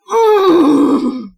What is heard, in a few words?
Painful Growl